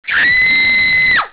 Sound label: WARNING; pang